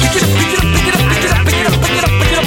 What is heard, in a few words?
ska